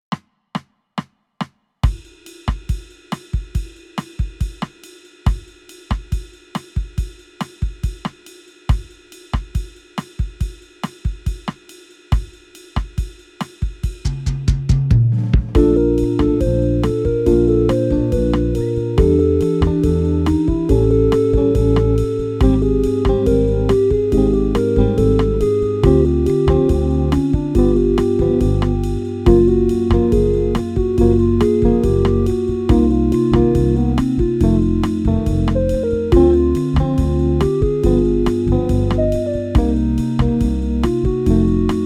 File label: playback little boat